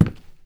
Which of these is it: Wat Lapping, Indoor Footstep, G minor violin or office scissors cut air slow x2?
Indoor Footstep